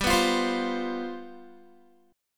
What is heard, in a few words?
G 11th, Guitar; strummed